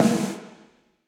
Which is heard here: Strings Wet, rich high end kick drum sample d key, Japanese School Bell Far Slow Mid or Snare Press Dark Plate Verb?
Snare Press Dark Plate Verb